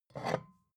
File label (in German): bottle vodka pick up slide hard, gastronomie